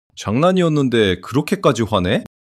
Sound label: surprise